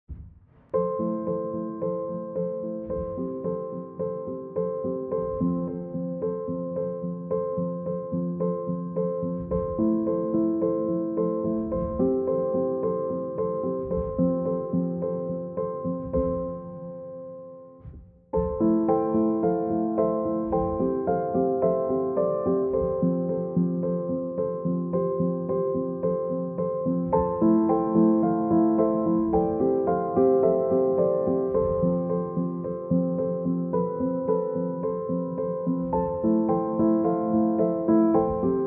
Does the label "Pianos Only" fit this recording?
Yes